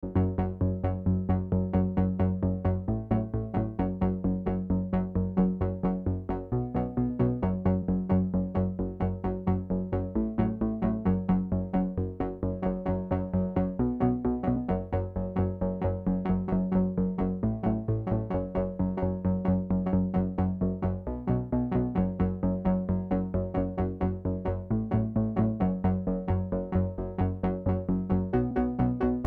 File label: arp dry; smasher